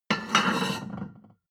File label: plate on plate place slide medium, restaurant cafe; sport-freizeit